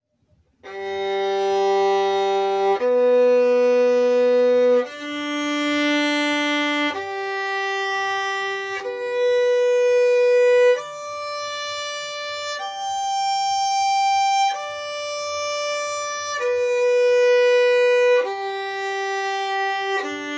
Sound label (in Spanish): Arpegio de sol mayor